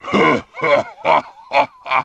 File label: laugh demon